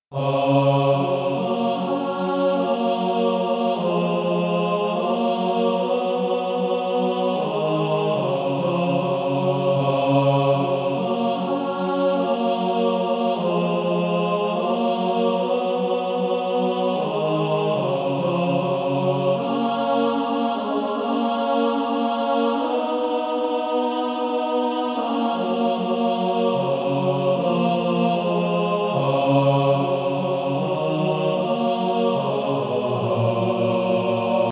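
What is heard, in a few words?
Male Choir, tenor bass; On Christ the Solid Rock I Stand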